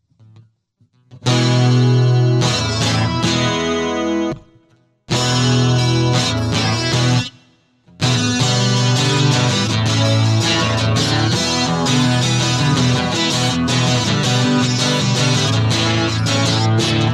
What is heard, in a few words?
Guitar